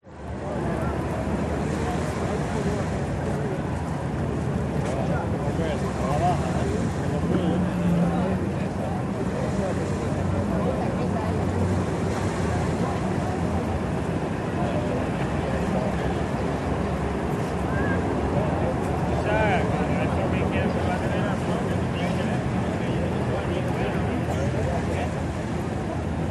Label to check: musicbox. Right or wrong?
Wrong